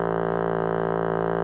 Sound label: vintage clock buzzer, Cartoon Sound Effects; UNUSUAL KITS and FX